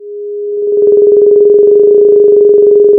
pop, Sound of a phone ringing

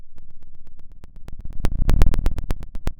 pop, Sound of a fire crackling

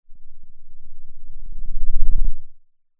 pop

